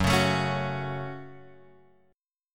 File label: F sharp Minor F sharp, Guitar Open G